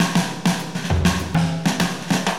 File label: DM LOOP, HipHop, Drums; LiveAct Pool; AcidJazz Funk